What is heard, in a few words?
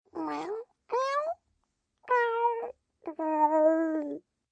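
cat Sleepy, Cat, or kitten meowing (human voice); Animal; Cats (Sound Effects:Animals); VoiceBox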